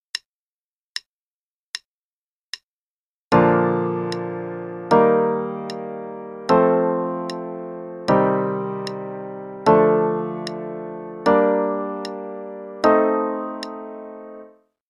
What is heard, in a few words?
Primary and Secondary Chords in Bb Major only, hn=76; CM Level 4